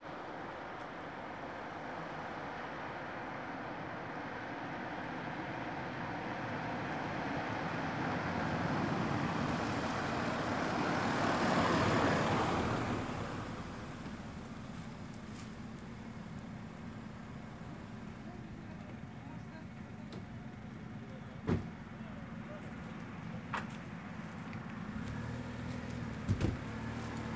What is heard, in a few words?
Road